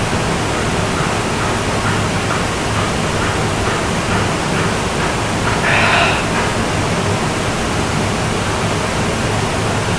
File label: Unexplained breath Amplified